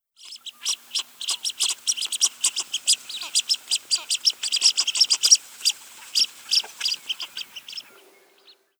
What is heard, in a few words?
Spatula discors, water birds; Chordata